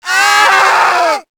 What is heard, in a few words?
kid zombie death; nmrih; childrenofthedead; zerosiki; slender